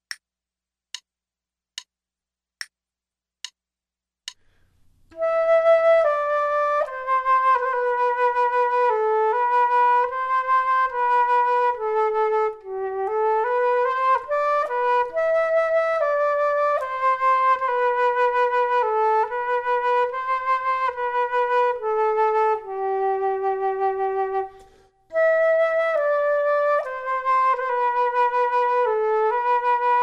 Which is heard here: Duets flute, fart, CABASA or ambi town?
Duets flute